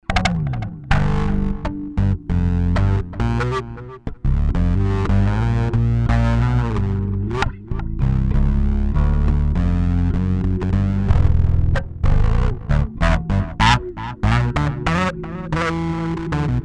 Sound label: P-FUNK LEAD, Zoom 506 II